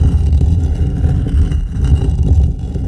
STONE SMALL LOOP

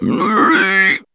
hurt

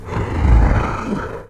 DIE

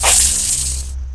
attack

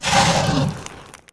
ATTACK

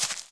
step earth

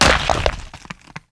DIE; mahlendur

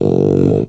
warn

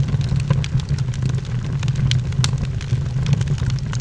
campfire

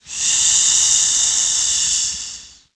breath

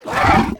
attack

